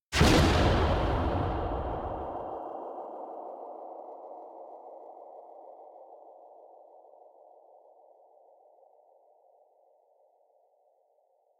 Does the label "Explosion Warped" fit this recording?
Yes